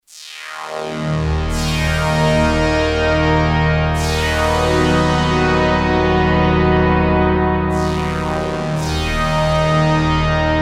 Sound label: Filter Sweep